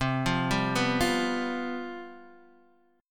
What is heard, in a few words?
C Major 7th Suspended 4th, C, down, Guitar, DADGAD